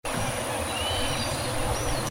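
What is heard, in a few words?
Porphyrio martinicus, bird; گالینول بنفش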